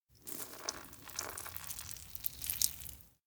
dog pee; anemoi

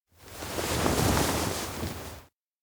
collapse; spica; anemoi

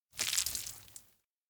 vomit jet; anemoi

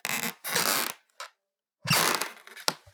wooden floor squeak; anemoi

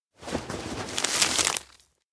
backpack map take; aino

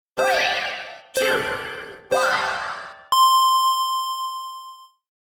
elec countdown racegame; anemoi

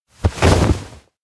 step front protect; anemoi

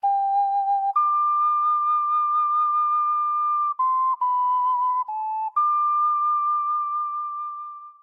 Ocarina

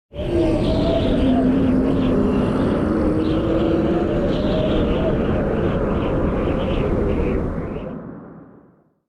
elec wind low growl; anemoi

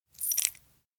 pendant clench; anemoi

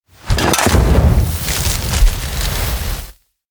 lantern hit sparks; anemoi

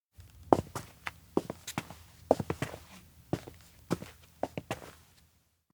steps outdoor observatory walk; awayuki; anemoi

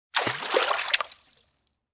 soak open air bath; anemoi

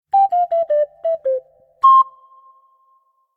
ocarina ochi; anemoi

